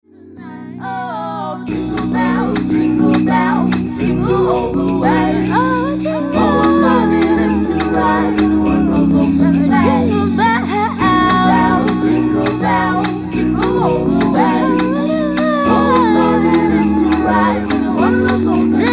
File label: jingle bells